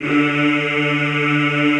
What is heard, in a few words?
Classical Choir, D2; Best Service; Extended Classical Choir